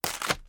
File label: egg break